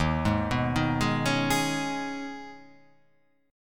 D sharp 7th Suspended 4th Sharp 5th

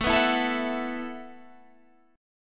E Suspended 2nd Suspended 4th A

